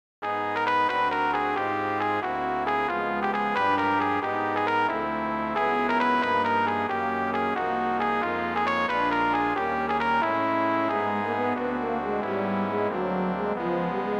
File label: Quintet for Brass IV